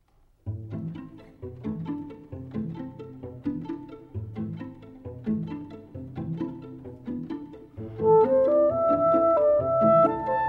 Clarinet, String Quartet & Piano

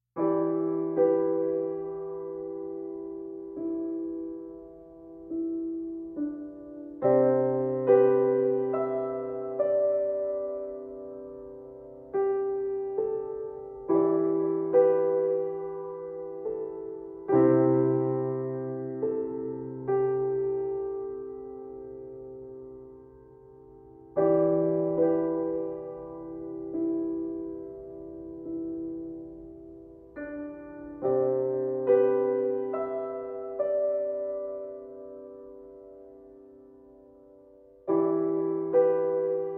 Piano Only

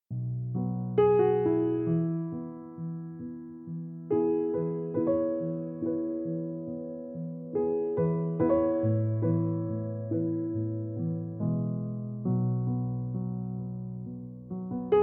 Piano Version

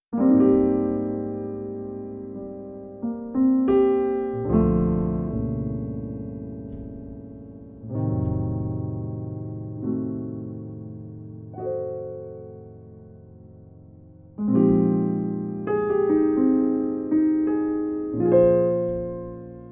Smooth Jazz

